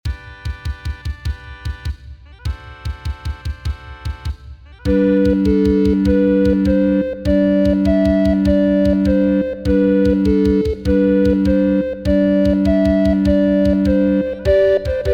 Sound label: Irish Washerwoman no Soprano